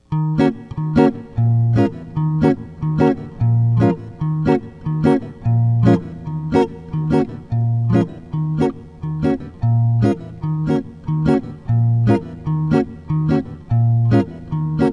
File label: Comp, jazz tunes